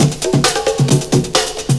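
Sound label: Congo Crisp loop; Urban Shakedown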